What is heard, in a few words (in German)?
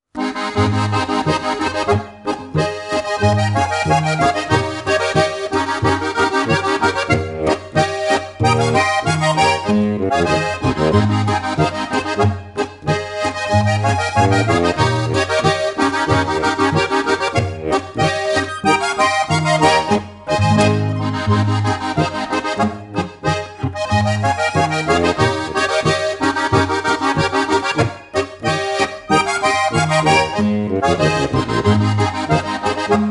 Harmonika